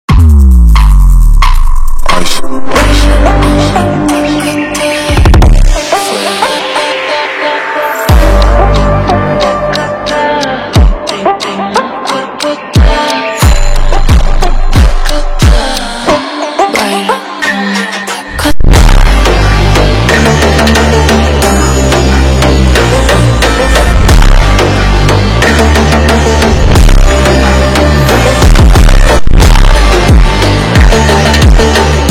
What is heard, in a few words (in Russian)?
pesnya funk taka slowed